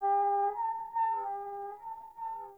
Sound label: Venus Landscape Distant Robot Call Low, Ambience; Warframe; Venus Open World